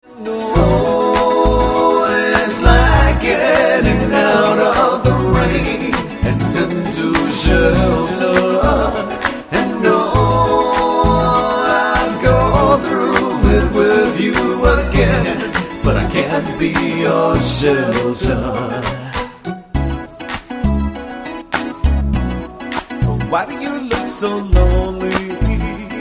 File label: Shelter Acoustic